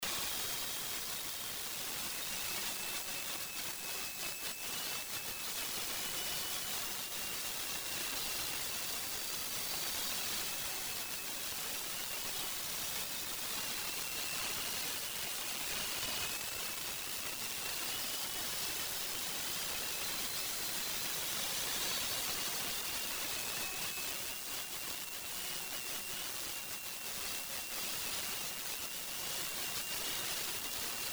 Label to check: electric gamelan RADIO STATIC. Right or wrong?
Right